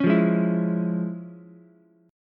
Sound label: F Minor Major 7th Sharp 5th Ukulele; FmM7#5 strummed; JGuitar